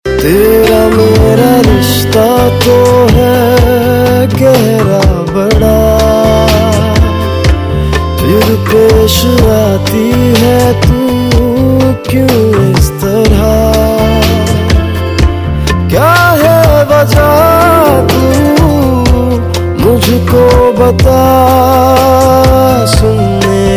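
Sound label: Ringtone, Bollywood Punjabi Pop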